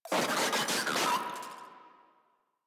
Moa Vocal Death; Enemies; Warframe

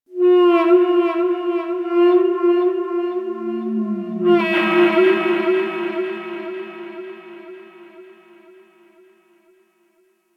Ocean Whale Bellow; Warframe; Grineer Ocean

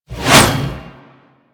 Scythe Whoosh; Weapons; Stalker; Warframe

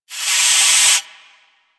Ocean Steam Blast; Warframe; Grineer Ocean

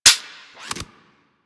Fire Mech, Rifles; Snipetron Vandal

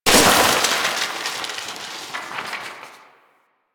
desktop book destroy; ambience; Warframe; Entrati

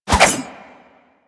Pole Arm Spin Whoosh; Warframe

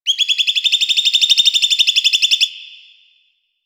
Drifter Camp Valley Tree Critter; Ambience; One Offs; Warframe; Quests; The New War

